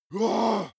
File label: monster growl attack deep; kreaturen